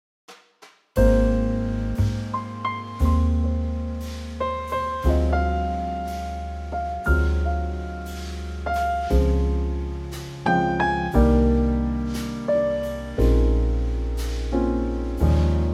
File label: Ab Trio